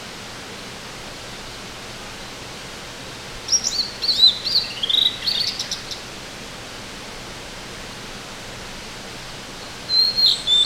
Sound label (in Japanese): bird